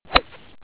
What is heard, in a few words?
Shot Iron, Club; sgh-d730; Ziogolf